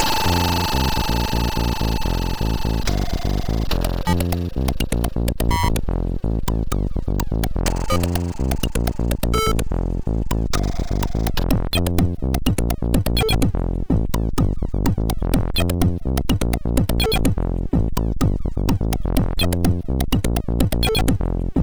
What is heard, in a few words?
Funky Punky MOS6581R2